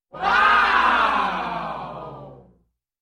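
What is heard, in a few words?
crowd shouting "wow"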